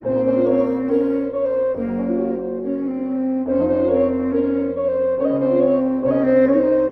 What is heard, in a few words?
flute and voice; Loops